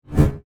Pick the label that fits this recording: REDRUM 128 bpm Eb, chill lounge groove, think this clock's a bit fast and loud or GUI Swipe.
GUI Swipe